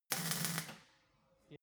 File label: weld sizzle